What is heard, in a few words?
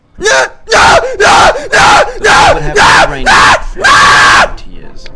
rage quit aaaahhh